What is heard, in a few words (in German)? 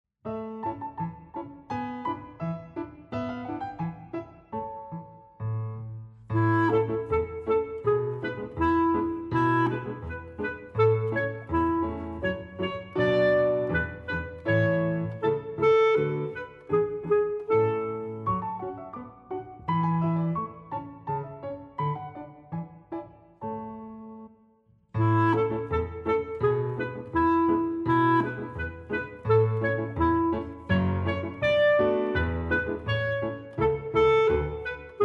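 Instrumental Brüderchen komm tanz mit mir; Bruederchen komm tanz mit mir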